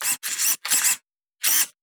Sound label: RC Car Steering, Buggy; Reely Core Buggy; SoundBits